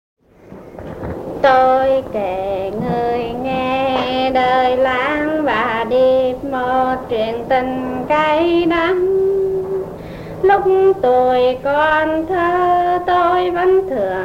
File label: Singing in Nha Trang; vietnam